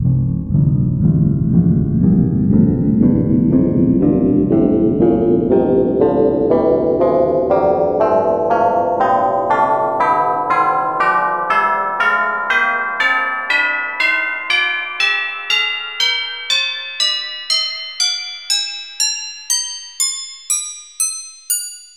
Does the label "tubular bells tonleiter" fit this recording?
Yes